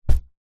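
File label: household pillow bamboo drop carpet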